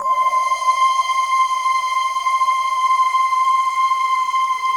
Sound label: C5, RAIN CHOIR; Best Service; Advanced Media Trax Modern Composer